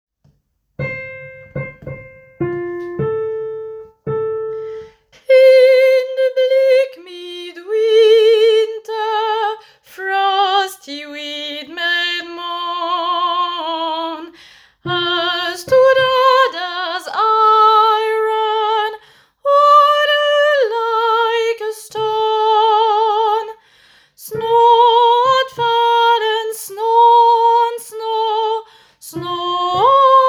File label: In the bleak midwinter tenor